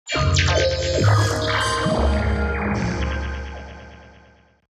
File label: melodic fx digital doodad Ab Maj; base pack